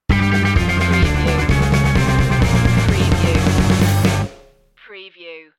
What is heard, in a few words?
rock sitcom ident